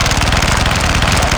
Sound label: minigun loop far; buraq